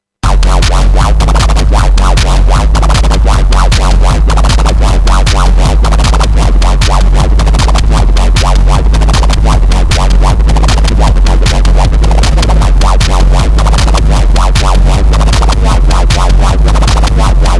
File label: wobble